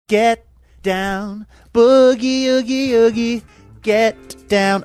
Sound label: singing